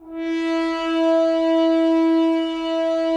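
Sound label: Solo French Horn, brass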